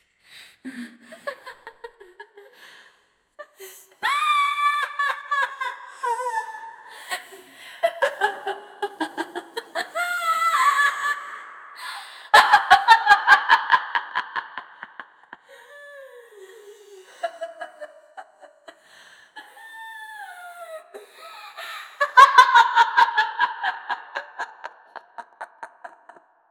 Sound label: evil female laugh with, cackling